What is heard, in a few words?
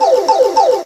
damage; omm coop; sm64coopdx